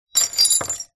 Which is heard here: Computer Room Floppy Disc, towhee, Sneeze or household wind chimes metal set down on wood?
household wind chimes metal set down on wood